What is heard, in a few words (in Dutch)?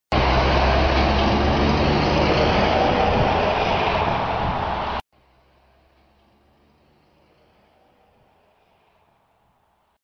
traffic noise 0 dB daarna -40 dB